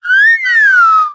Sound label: Yawn Voice